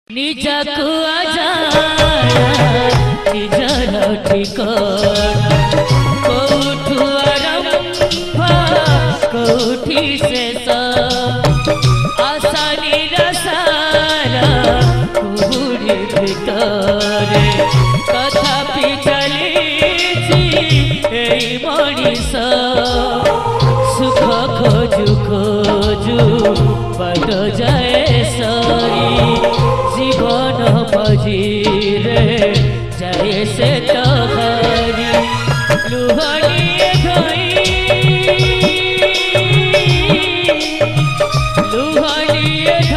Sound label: Sad Song